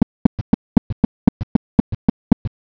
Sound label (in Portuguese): galope S3 S4, sons cardiacos